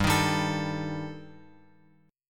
G Suspended 2nd Sharp 5th; Gsus2#5 Chord {3 6 5 X X 5} strummed

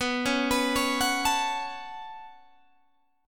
B 7th Suspended 2nd down Guitar Open G, chord

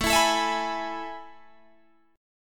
A Major 7th Suspended 2nd, Guitar, Drop D

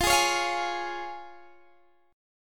F Minor Sharp 5th F down Guitar Open D

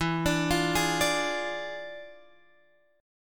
E 7th Flat 9th E down Guitar Open G

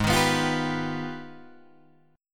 Ab Minor Major 13th, down, Guitar, DADGAD

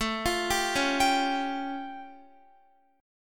A Augmented 7th, Guitar; x,12,15,12,13,14; 4E,4A,5D,5G,5C,6F; arpeggiated

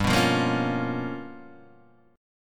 G 7th Sharp 9th Flat 5th G, Guitar DADGAD; down

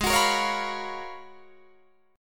Ab Minor 13th, Ab, Guitar, Drop D

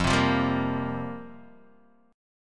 F Suspended 2nd Suspended 4th, Guitar, DADGAD; 3,3,3,0,1,x

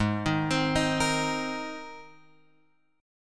G sharp Major Add 9th, chord; G#add9 Chord {4 6 X 5 4 6} arpeggiated

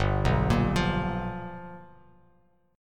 G 7th Sharp 9th G chord, Bass Standard; G7#9 arpeggiated; JGuitar

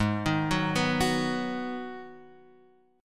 Ab Minor 7th, down, Guitar, Half Step Down

